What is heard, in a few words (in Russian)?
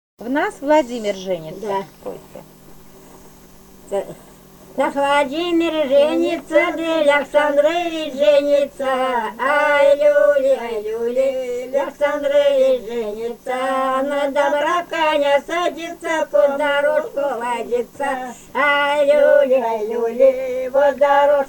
Свадебные песни; Псковской области; Объекты нематериального культурного наследия Псковской области; В нас Владимир женится